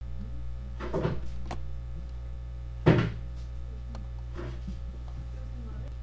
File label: tunnel